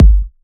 128BPM Kick; Vengeance Samples; VFH1 128BPM Mini Space Kit

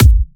128BPM Kick; Vengeance Samples; VFH1 128BPM E Samba Kit

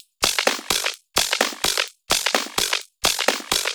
Houseloops 128BPM; Vengeance Samples

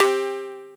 Synth Shots; Vengeance Samples

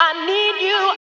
Vocal Phrases; Vengeance Samples; Vocals

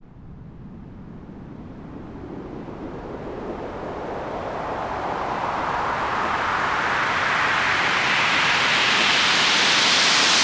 FX Uplifter

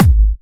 Kicks; Vengeance Samples

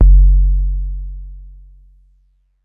Bass Drop, 808; Street Anthems Sound Kit

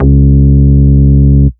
Ultimate Bass Root C#; Vengeance Samples

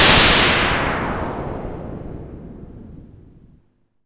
Impacts

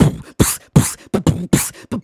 Beatbox Loops, Human Beatbox

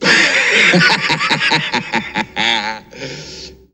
LoFi Vocals Oneshots; Vengeance Samples

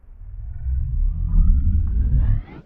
VFX2 Sub

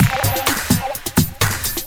Housebeats, 128BPM; Vengeance Samples; VEH2 Loops

